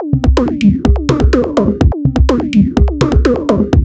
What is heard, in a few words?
Robodol 125bpm, House Drumloops; Zero-G House Fabrik; 90 s Sample CDs